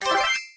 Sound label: item pick; BoatRush; Racing Games